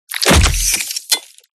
DESIGNED CINEMATIC CHOPS; PMSFX

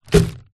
apples packet down on wood surface

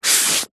office sponge brush single stroke on paper; zapsplat

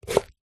pear chop slice knife wooden chopping board

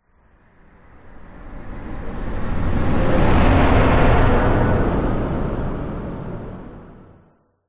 whoosh; orlando lanzini

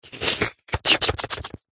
human mouth slurp lips; zapsplat

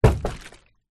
bottle water large down hard on surface

